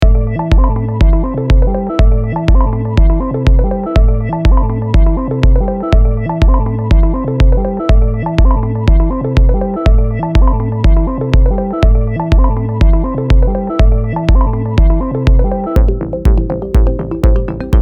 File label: Signature Argeggios